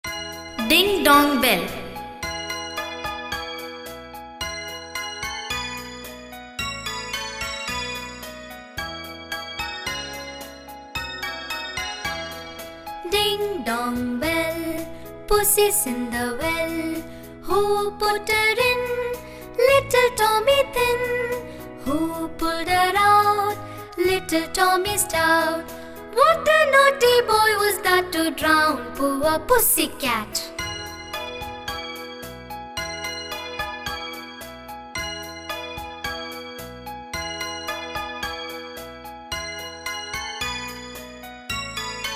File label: ding dong bells